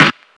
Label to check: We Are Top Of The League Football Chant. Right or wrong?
Wrong